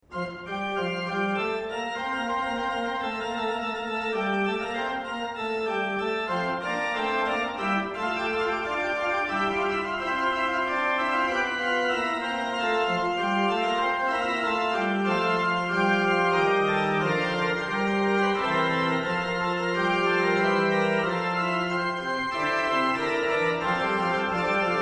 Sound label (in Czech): mechanické varhany, jeden manuál, 10 rejstříků; kolem roku 1850; jsou v dobrém stavu; Joseph Müller; Valeč